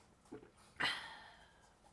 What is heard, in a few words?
Drink Gulp And Sigh